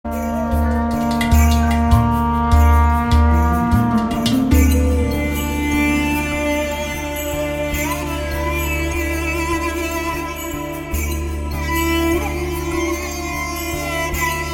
Sad Bgm

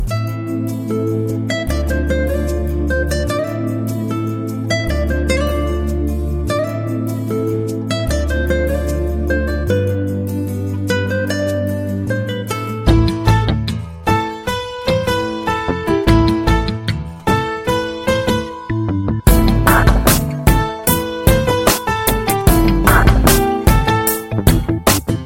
Instrumental Tone

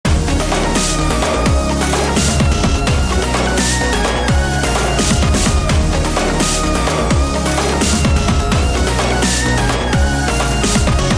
Love BGM

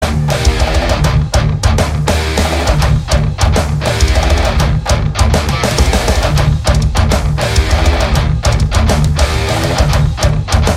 Guitar Love Bgm; Urimai

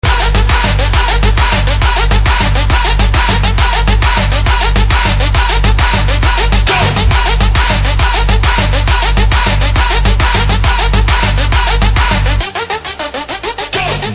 Instrumental BGM; Laxmmi Bomb Movie

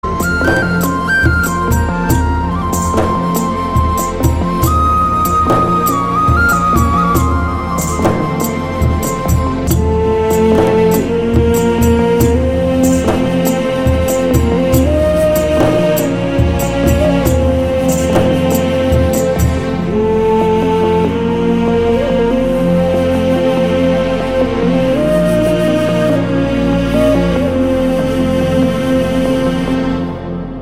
Flute BGM; Paalaksha

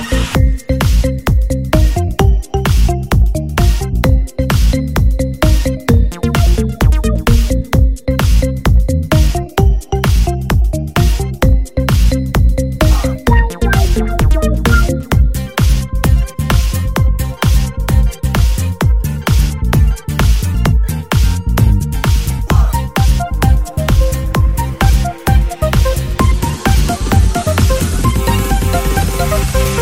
song background bgm intrument music bits

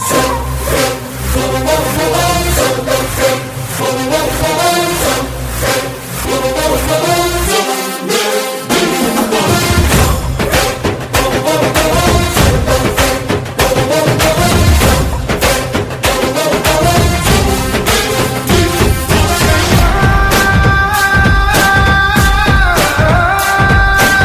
Saxophone Tone

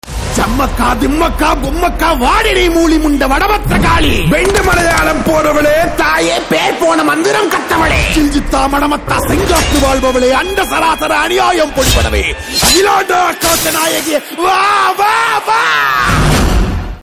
Tamil Trailer; Kanchana 3

